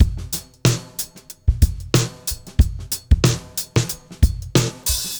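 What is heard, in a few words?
92 bpm, beat loops; Zero-G; Monster Beats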